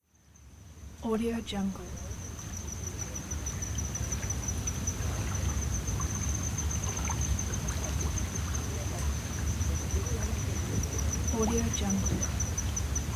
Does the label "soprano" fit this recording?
No